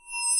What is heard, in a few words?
Pass By